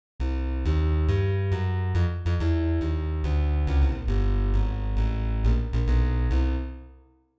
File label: Damper Off; SS Masterclass; Iamafan